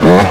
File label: throttle blip hi, YAMAHA